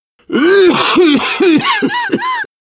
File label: cough, survivor voice biker